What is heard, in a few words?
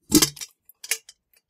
pickup, Helmet; Kenney's Sound Pack; Foley Sounds